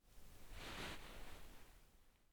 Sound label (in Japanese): 衣擦れ中央から左; 夏実の膝の上で衣擦れ